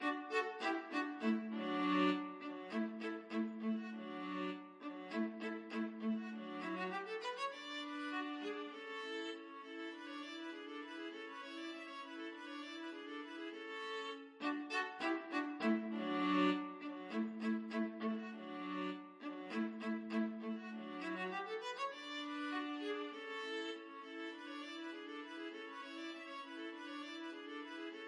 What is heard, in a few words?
viola duet; mozart k487